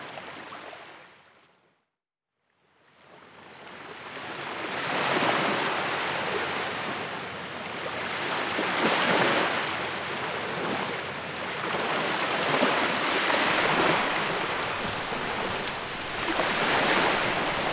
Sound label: Waterfall